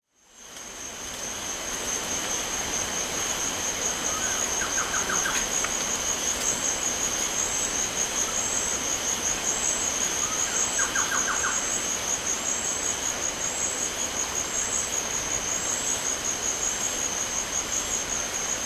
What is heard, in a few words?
Forest at night with nearby river